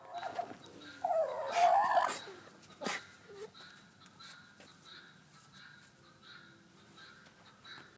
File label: Fussing, alpacas